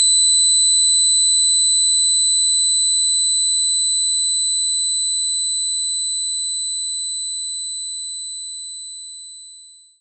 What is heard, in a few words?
Pick Bass C6; knights try